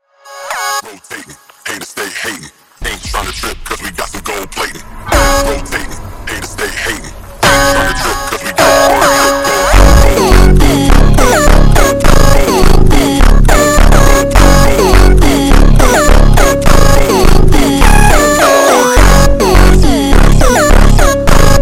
super Slowed, funk

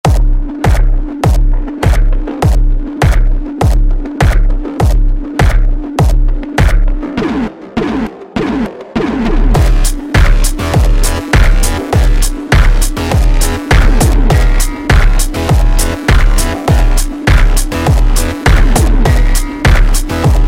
sped Up, funk

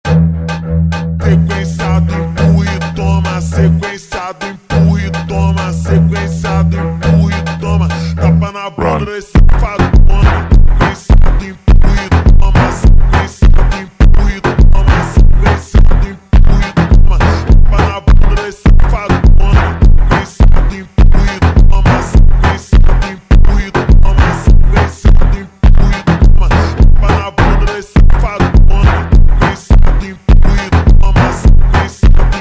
Funk super Slowed

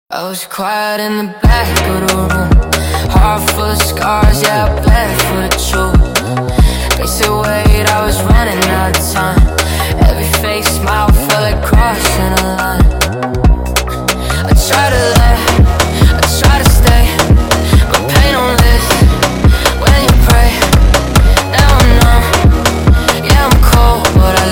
sped Up, funk

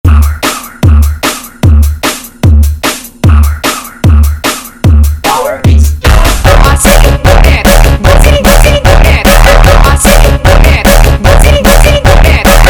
Tekk

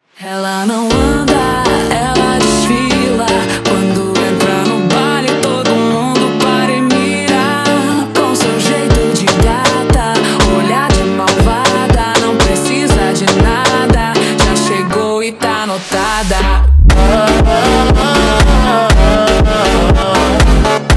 slowed, funk

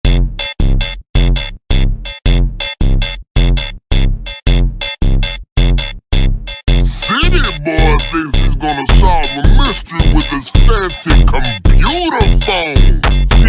Jumpstyle extreme Slowed, funk

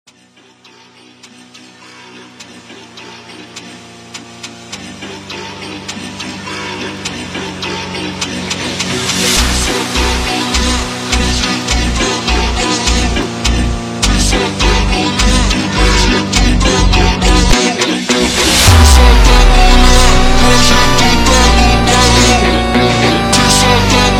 Funk, super Slowed

